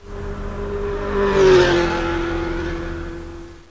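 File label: motorcycle, tono di notifica motocicletta; Motocicletta Ver. 3; per Cellulari Android o Iphone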